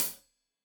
Hat Tight, Hi-Hat, Cymbals

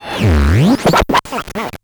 Bent Talking Teacher, Circuit Bent

